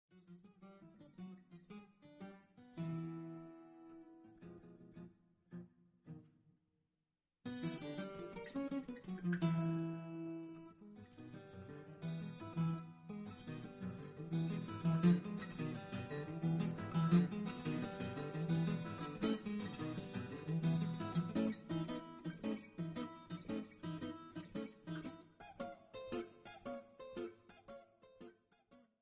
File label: Piano Sonata no 7, transcribed for guitar; Sergei Prokofiev